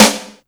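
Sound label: snare drum, Drum & Bass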